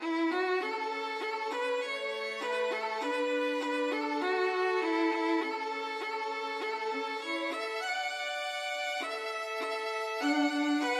loch lomond violin duet

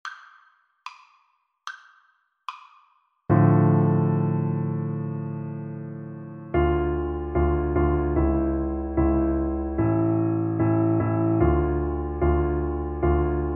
bassoon

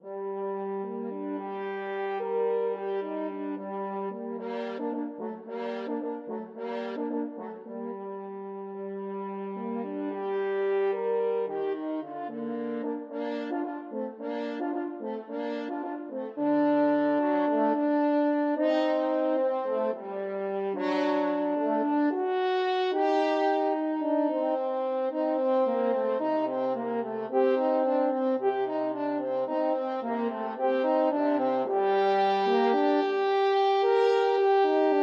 french horn duet

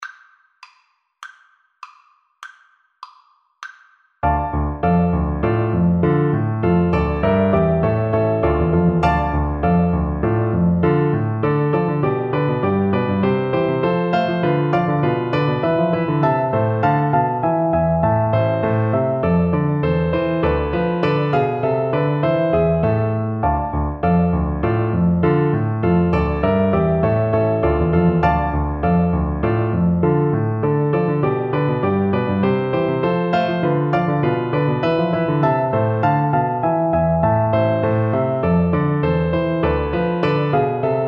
bach badinerie, Alto Recorder

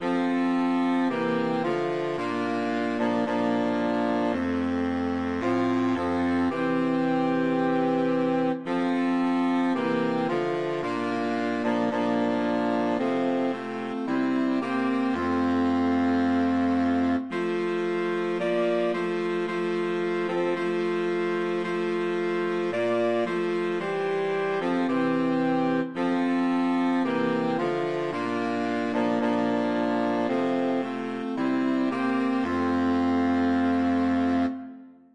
nearer my god SAX QUARTET

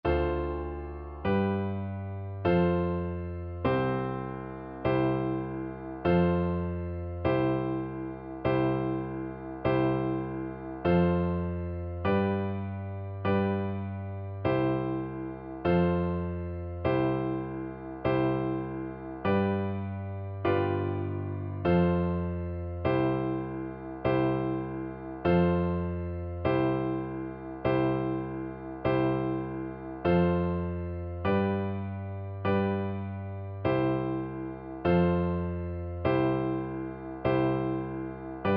nobody knows, vibraphone, Percussion

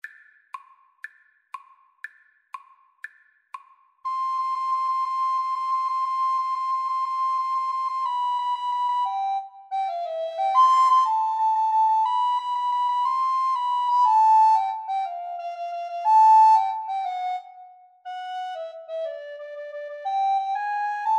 italian, Recorder Duet

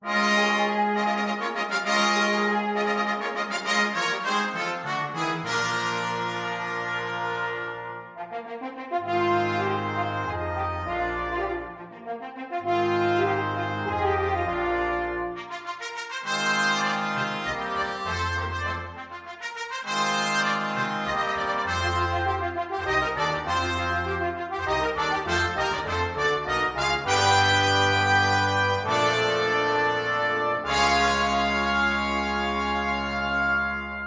fanfare, brass ensemble

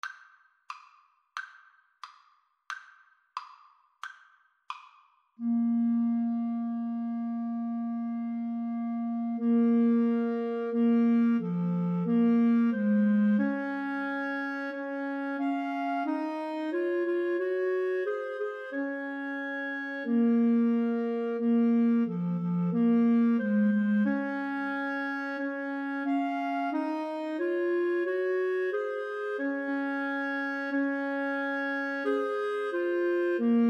pavane, Clarinet Trio

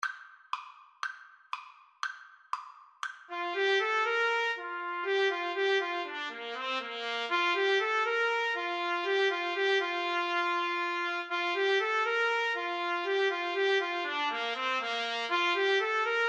baltimore belle trumpet trombone

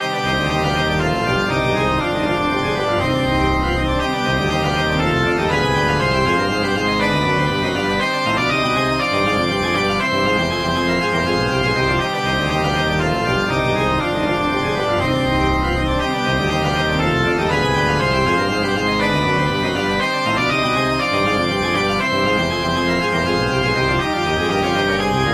organ

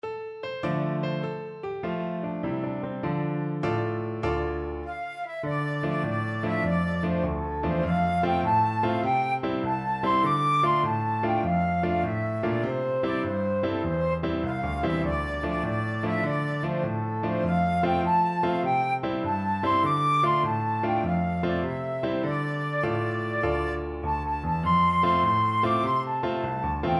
hot ashphalt, flute

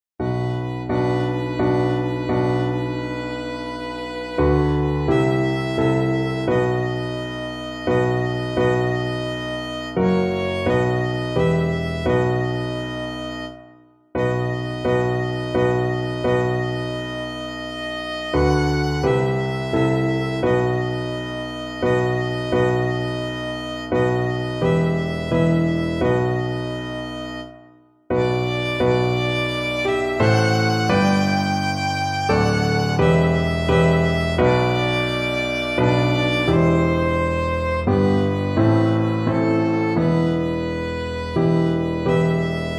tallis theme violin

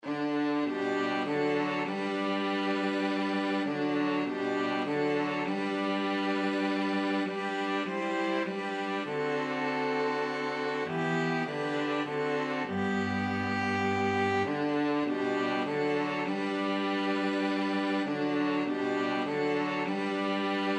string quartet

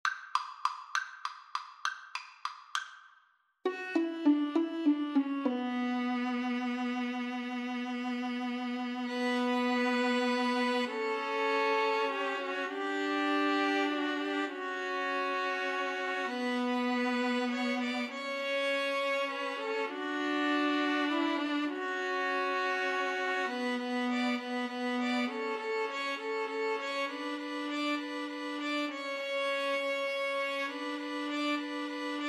spanish violins trio

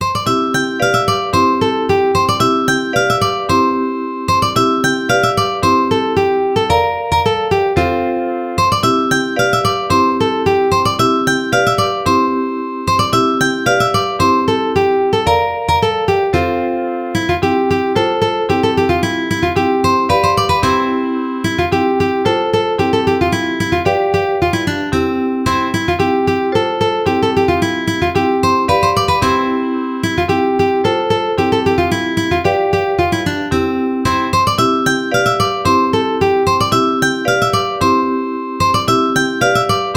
UKE

